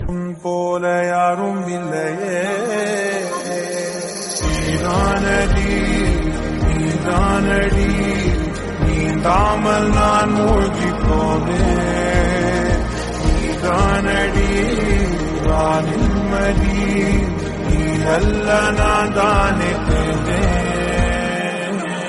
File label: Tamil Song, ringtone